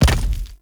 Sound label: Obelisk Stone Connect